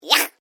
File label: Created Creature Small Yuck, Alien Sound Effects; Created creatures, vocalisations and pretend speech